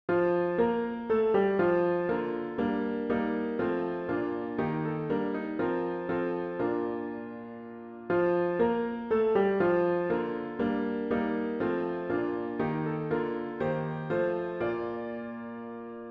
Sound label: We Bring Our Weakness piano with Intro